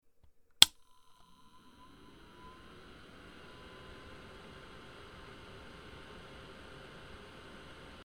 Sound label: fan On